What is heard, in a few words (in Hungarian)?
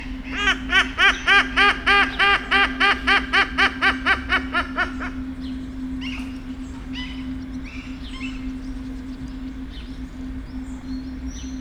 ezüstarcú trombitás szarvcsőrű; debreceni zoo 2019